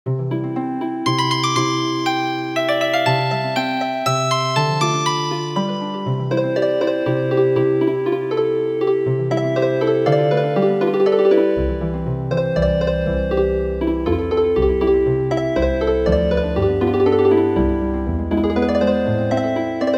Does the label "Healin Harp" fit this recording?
Yes